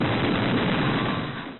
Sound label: explosion high; 3dcity